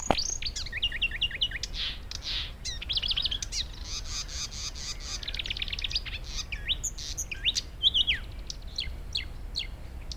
Sumpfrohrsaenger; Murnauer Moos; Christian Haass

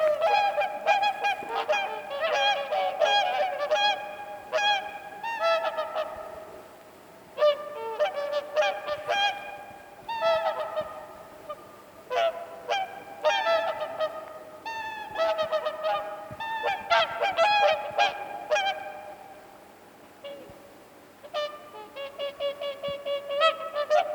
Singschwan; Lechstaustufe 27 Feldheim DON; Daniel Honold

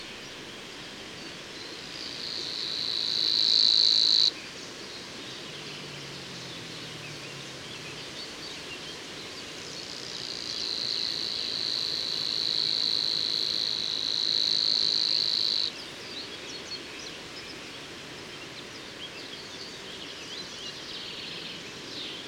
Rohrschwirl; Moos DEG; Michle Peron

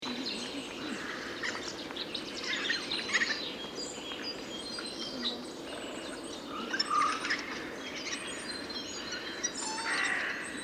Eichelhaeher; Landshut LL; Michle Pron

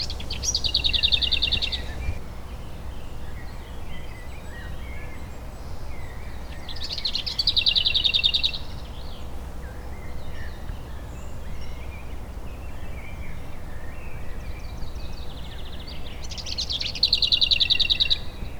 Klappergrasmuecke; Landschaftspark Unterhaching; Michle Peron

